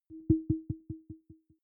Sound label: button echo tonal; signale